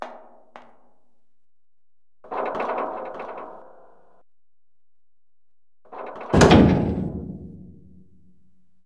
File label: Bucket Get In